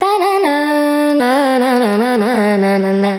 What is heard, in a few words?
Indian Vocal Loop 150 F Arabic